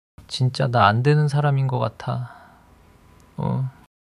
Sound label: crying daily crying